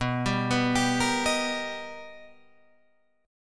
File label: B Augmented Major 7th B, Guitar, Drop D